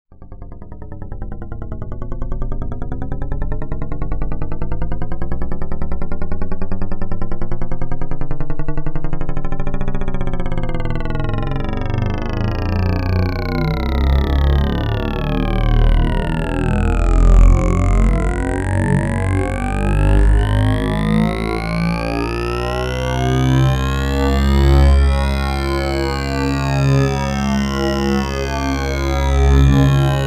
big stereo, Phaser; Euro Dual Phaser

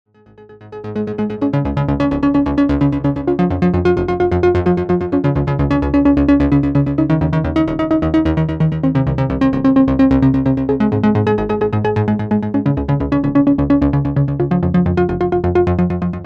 ping pong, Digidelay

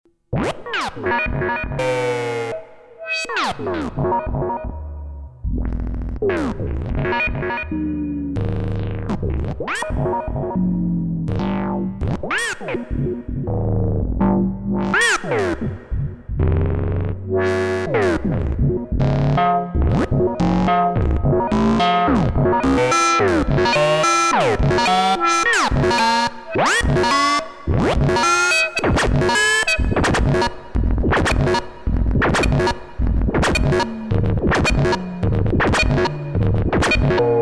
Rotate verb; Quad lfo

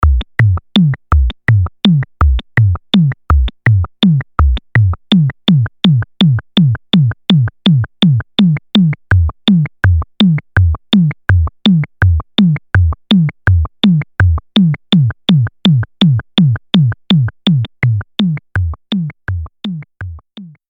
sync LFO; Quad lfo

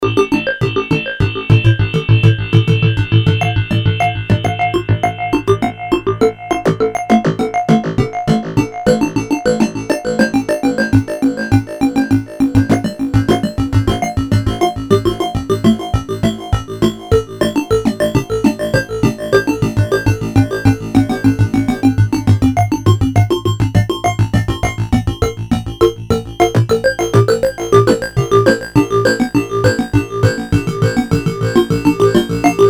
dual tap, dual delay

